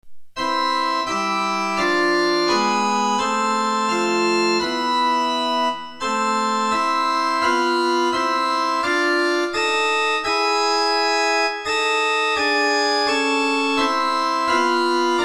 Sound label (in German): glocken